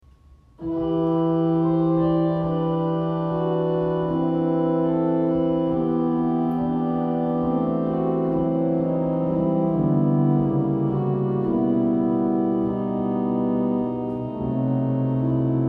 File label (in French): orgue Cavaille-Coll; Au pied de l'autel; Guy Ropartz; St Etienne de Caen